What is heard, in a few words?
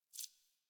UI Default Theme Tooltip; Warframe